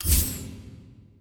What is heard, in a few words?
hero select slide; ui; dota